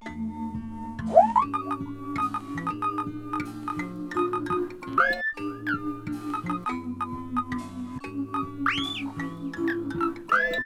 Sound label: Melody, Hip-Hop